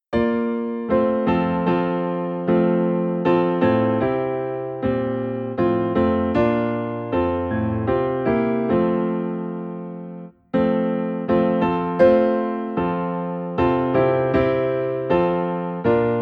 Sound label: The Solid Rock - Piano in F